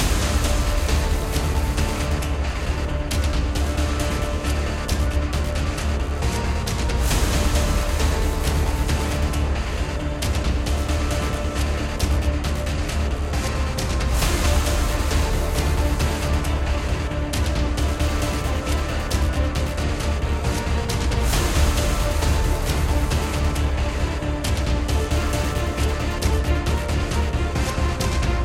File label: bat epic loop